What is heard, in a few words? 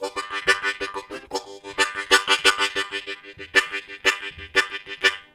Offbeat Chug, Harmonica; Zero G